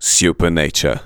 vox; Ueberschall; Techno Trance Essentials

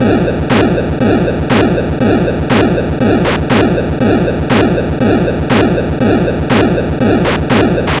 Atari 2600 Noise Drums

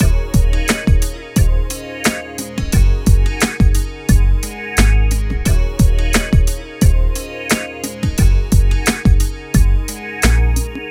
MIX, Hip Hop and R&B Drumloops

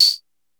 909 BLIP; E-MU Formula 4000 Series Vol. 2

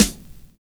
NOISY TRE; Univers Sons

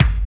ENRGY KIK, DNC KIKS; Drums In Your FACE!

